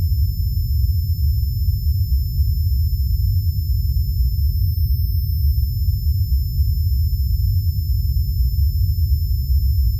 tinnitus; Barotrauma; Real Sonar

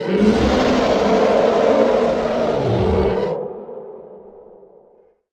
roar; Barotrauma; Barotraumatic; Voidleviathan

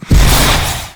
jetpack burst; Tools; Barotrauma; EK modern

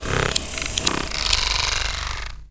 guardian; Barotrauma; Fractalguardian

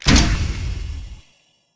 harpoon, Weapons; Barotrauma; Subsurface

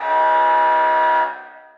derelict alarm; Barotraumatic; Carrier Leviathan

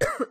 female cough; Voice; Barotrauma; Neurotrauma

